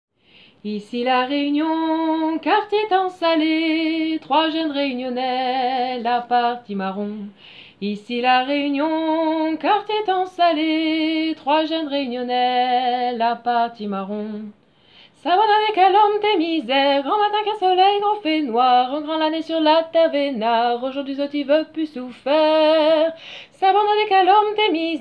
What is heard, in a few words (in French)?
voix medium